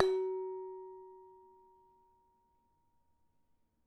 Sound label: bellplate 10mm; bellplates